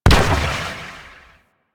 Arachnoid Wraith Multi Gun Overcharged Explosion; Warframe; Corpus; Abilities

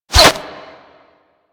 Bullet Default Hit; Warframe

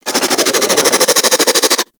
Infested Crawler Attack; Warframe

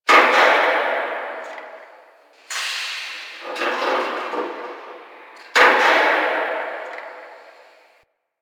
Landscape Construction Site One Off, Ambience; Warframe; Venus Open World; One Offs

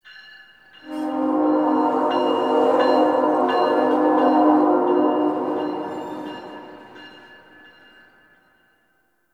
Distant Train; Warframe; Ambience; Nineteen Ninety Nine

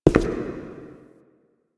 Shadow Figure Steps

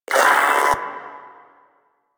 Proto Infested Maggot Awake, Vocals; Warframe

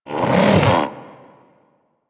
Armadillo Vocal Alerted; Characters; Warframe; Hunting Critters

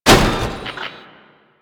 Electronics Misc Destroy

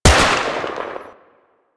Skiff Rifle Fire; Warframe; Eidolon

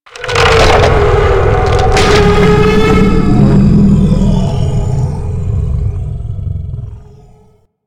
Regrowth Orb Chatter; Props; Warframe; Ambience; Sentient Devourer

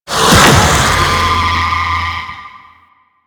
Triangle Arm Destroyed; Warframe; Man In The Wall

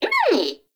baby vocal; Nokko; Warframe; Nokko Colony; Find Nokkos

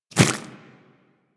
Infested Small Walk Dirt, Footsteps; Warframe

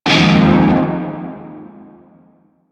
Shawzin Min Chord F; Music; Warframe; Narmer Shawzin

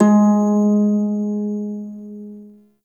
Prime Shawzin Oct One G Sharp; Warframe

